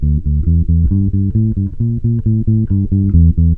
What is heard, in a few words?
PUNK 134 D, Bass Grooves; USB Soundscan vol.30; AKAI